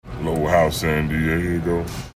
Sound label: sing